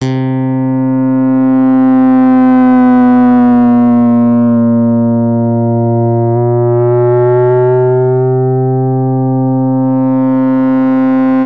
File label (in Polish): gitara